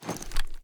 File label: kilo98 rechamber end; viper